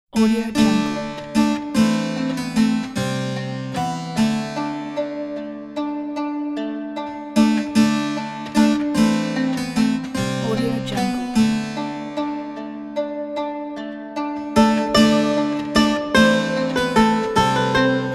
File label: fantasy in the medieval forest